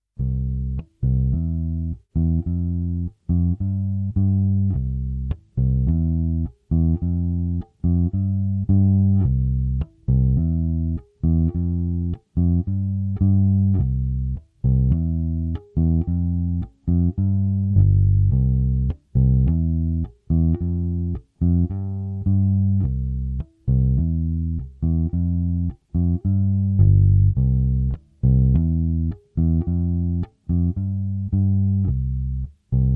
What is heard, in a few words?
Rising Bass